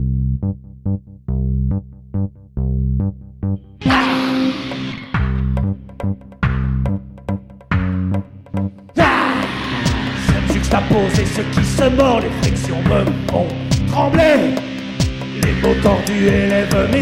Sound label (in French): percussifs